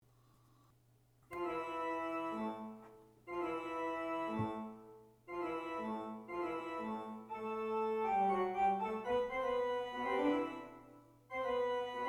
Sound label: Fugue for Halloween, organ